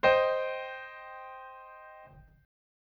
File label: Cm higher, chords